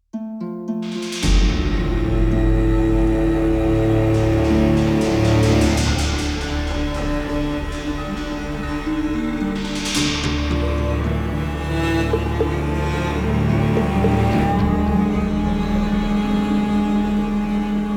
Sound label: Monster Hunting